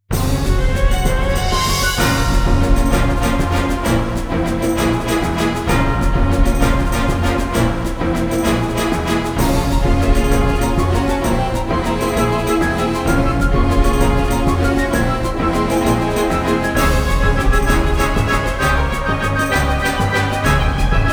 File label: Enemy Advance Theme; Open Enemies, Concealed Trap; Gloria Union